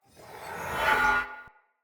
Great Sword Whoosh Accent Heavy; Weapons; Player; Mendicant Great Sword

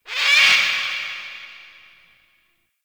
Bird Ruckus Vocal; gameplay; Warframe; Entrati Labs

